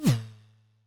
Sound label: Swoosh Slow